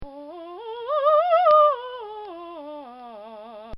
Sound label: Female Descending Scale; Communications